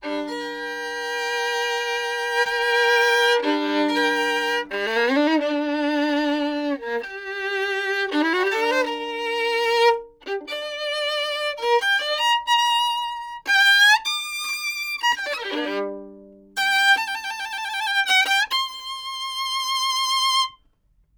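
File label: domra, Neumann TLM170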